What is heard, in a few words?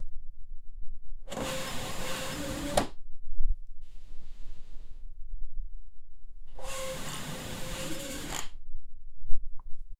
sound of a chair being